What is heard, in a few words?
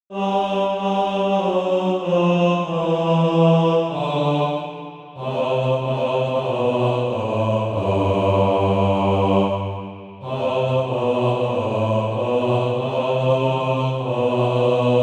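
bas, Practise Music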